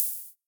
ride cymbal drum sample G key

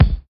bumpin kickdrum sample B key

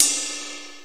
crash cymbal drum sample a key; cymbal drum samples

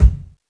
verby kick drum c key; tuned drums

